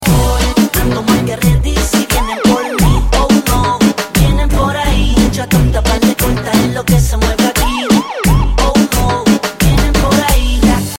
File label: Reggaeton